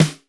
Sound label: WOOD, R8 DRUMS; Crypton - DrumBase5000